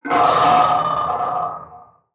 FRACTAL GUARDIAN death; Legacy

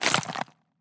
Gore; Damage

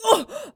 female damage; Human

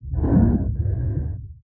Drown Female

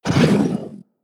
damage, Mudraptor

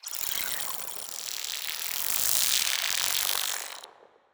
SPINELING idle

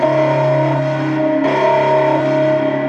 warning siren

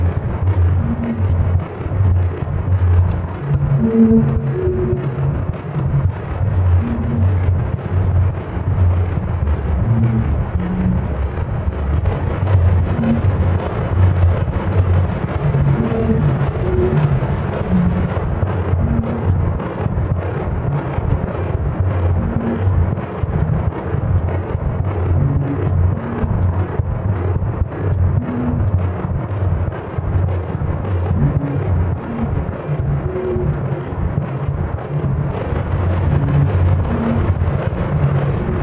Intensity Layer, Music

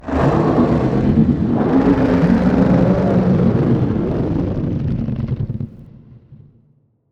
ENDWORM tail Cut

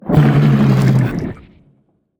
dead, Spineling giant

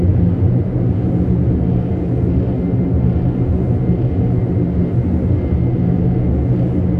Ventilation; Oxygen Generator

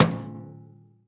footstep armor; Footsteps, Armor

